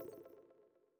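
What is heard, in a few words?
notification error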